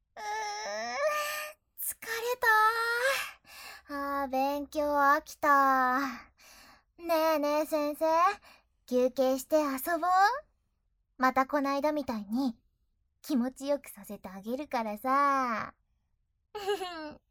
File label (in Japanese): フェラチオ ノイズ除去済 効果音なし